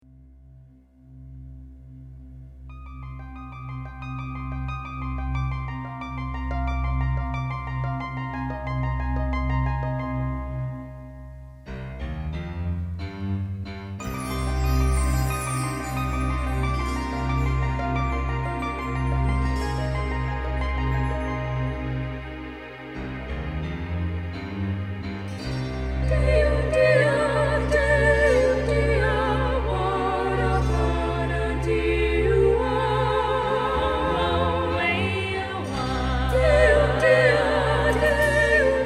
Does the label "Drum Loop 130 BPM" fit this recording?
No